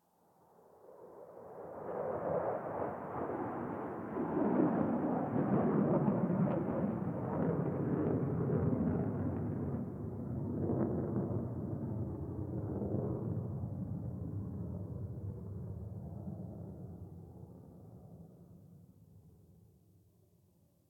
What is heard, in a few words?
Distant Ambient Fighter Jet; Warframe; Ambience; Nineteen Ninety Nine